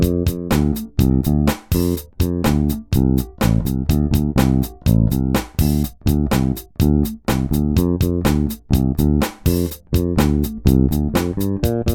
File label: warwick thumb nt 6 passive; Hals-/beide/Steg-Pickup (passiv); Sackschwer (5,7kg)